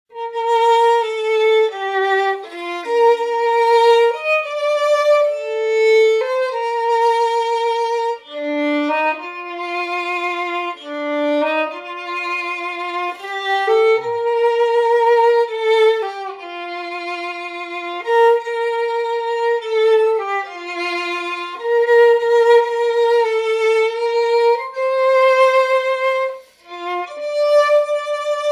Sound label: Irish Wed Song